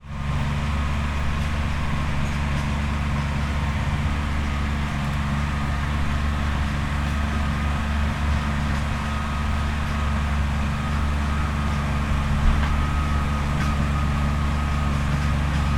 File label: industrial small cultivator tractor operating close by